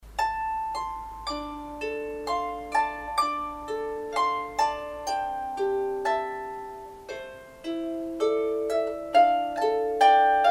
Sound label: harp; amemori